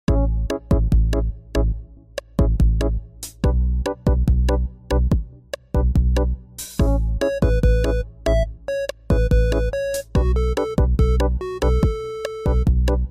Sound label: Bossa Nova